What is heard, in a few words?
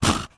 goblin toward; Crypt of the NecroDancer